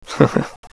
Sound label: "heh", taunt; phx new taunts pack; props taunts new